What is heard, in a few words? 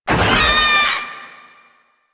Proto Infested Maggot Attack, Enemies, Vocals; Warframe